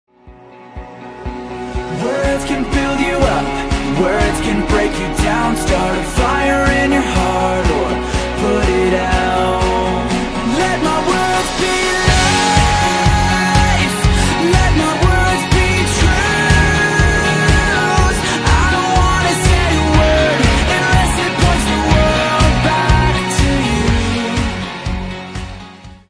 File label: pre chorus into chorus